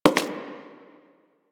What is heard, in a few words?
Human Loid Foley Step; Characters; Warframe